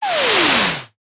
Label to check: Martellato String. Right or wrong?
Wrong